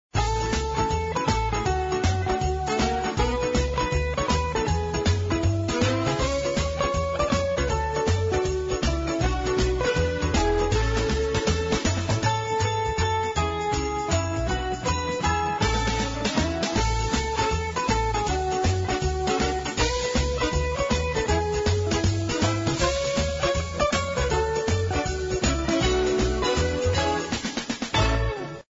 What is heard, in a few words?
music; prep and landing; kringle Games